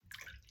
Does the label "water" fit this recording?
Yes